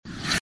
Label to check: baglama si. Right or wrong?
Wrong